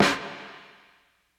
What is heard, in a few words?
80s reverb snare; Acoustic